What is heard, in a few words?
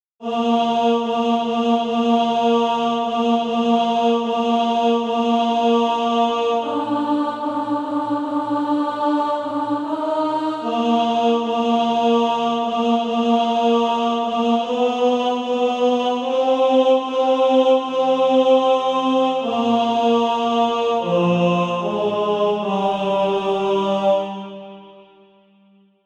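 tenor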